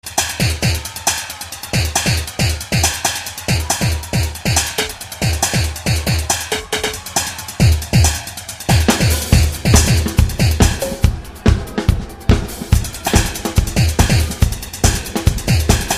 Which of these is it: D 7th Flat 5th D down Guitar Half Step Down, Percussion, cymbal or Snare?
Percussion